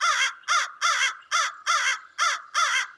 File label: crow